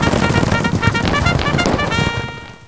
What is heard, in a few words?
cavalry; Introductory Quizzes